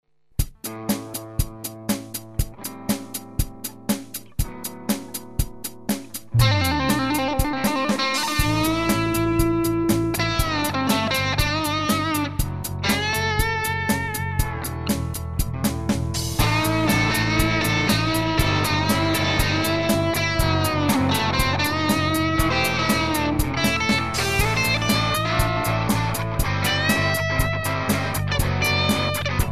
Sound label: Rock